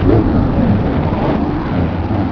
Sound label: alien valve, ambience